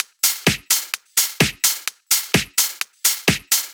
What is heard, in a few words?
Loop, 128 BPM